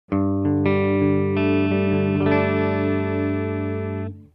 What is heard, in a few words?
Audio-Technica ATM63 Clean (Neck), guitar